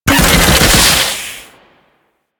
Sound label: Fortress Drone Turret Fire; Ambience; Warframe; Grineer Fortress